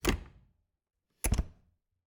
Refridgerator, old fashioned, wooden, steel, brass clasps, hinges, open close, quick; Eiravaein Works; Vaeyan IV